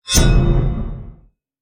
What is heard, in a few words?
Enemy Spawn